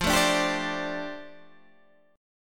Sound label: F 6th Add 9th Guitar Open G, chord